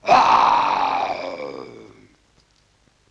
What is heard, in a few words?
LoFi Synthetic Lion Roar; Vintage Cartoon; Comedy Vocals